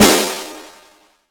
SNR, Drums; Acoustic and Electric; Polyend; Simulacrum Sounds; 1 Shots

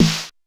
Snare, retro house samples, Drum Hits

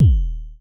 FM Kicks D#1, Vermona Kick Lancet; LookIMadeAThing Kicks

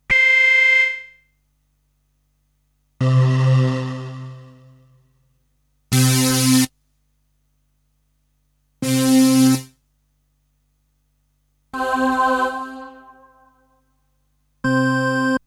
Stabs; Kniteforce; 1000 Samples Volume 1

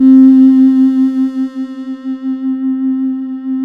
SYN RESO, SYN MicroWave; Sound & Vision - Gigapack I CD 2

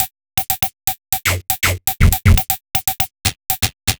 Odd Glitch, 120 BPM, Percussion

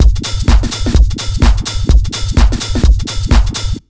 127 BEAT, Disco House

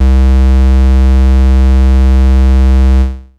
Techno leads, hits and riffs

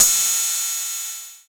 HH606, ANALOG, TR-606; Sound & Vision - Gigapack I

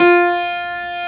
STUDY PNO; East West; Global Sounds

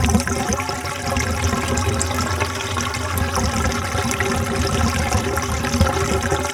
WATER; Rarefaction; Poke in The Ear With A Sharp Stick

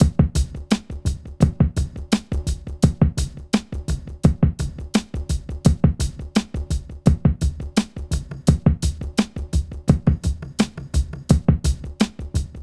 RAGGA; Funky Xtreams; INIS

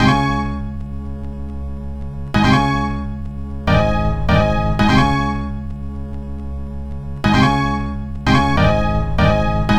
Organ; Sonic Foundry (Sony Creative Software); Club Joints and Street Anthems

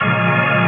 ORGAN L, Drum & Bass 180 BPM; Ueberschall; Drum & Bass Flash Trackz

